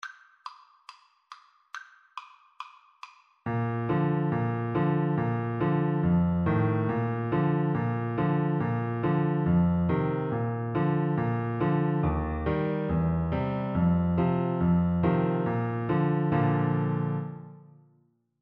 yankeedoodle BN, Bassoon

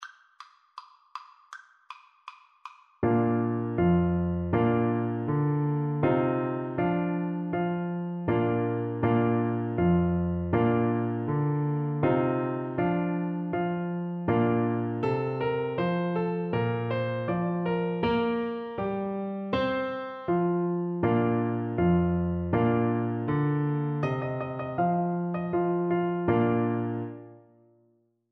deck the halls BN, Bassoon

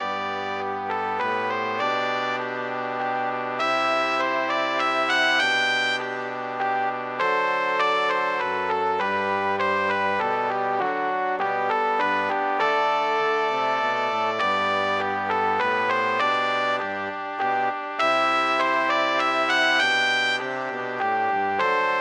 brass quartet

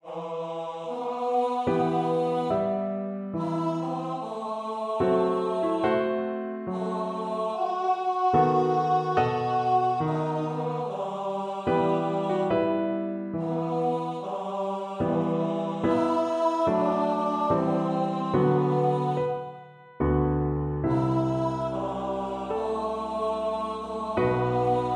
behold and see, tenor voice

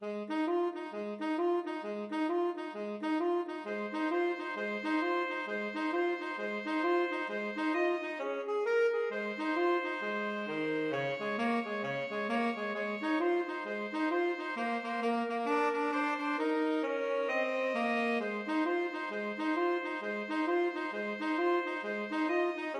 boogie ASAX TSAX duet; jingle bells

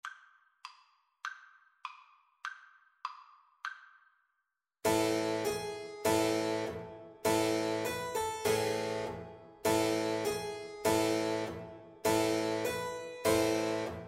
tambourin suite in Em, Alto Recorder

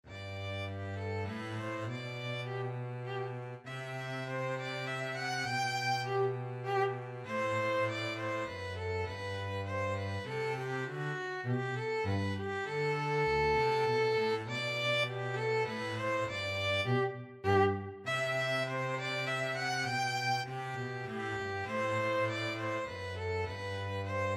minuet, violin bass duet; bach

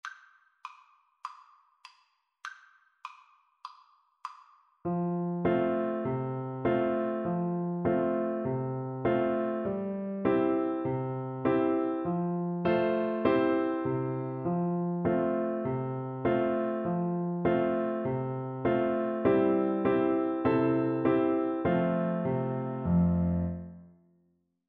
mary had a little lamb BN, Bassoon

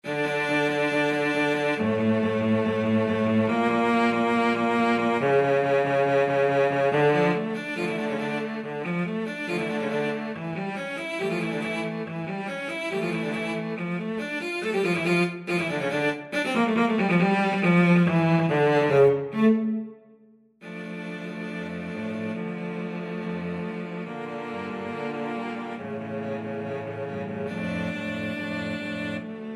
cello quartet

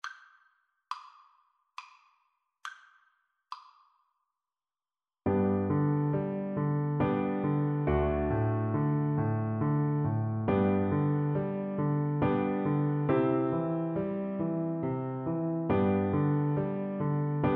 man you dont meet, Viola

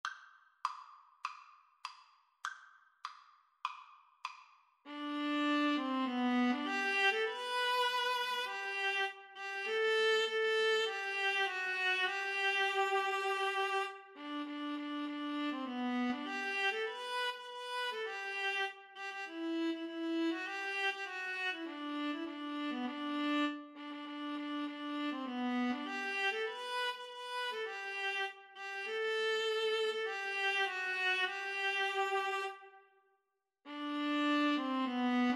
viola, Viola Duet

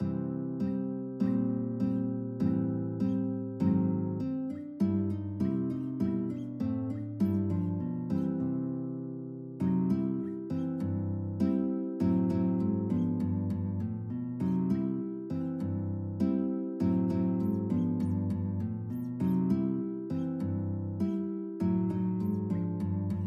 Western Blues duet, guitar duet

